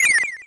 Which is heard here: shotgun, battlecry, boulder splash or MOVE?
MOVE